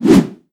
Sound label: Toss 9 ball; Nicktoons Nitro; arcade